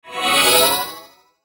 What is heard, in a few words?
Shimmer Flange High; Shimmer Flange Ascend; High Tone; Blastwave